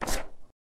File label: ink touchdown, LG G3